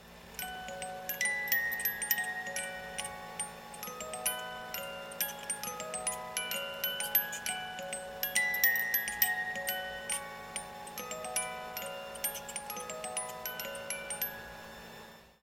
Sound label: Music Box; Traverse Town